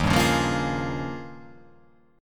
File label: D sharp 11th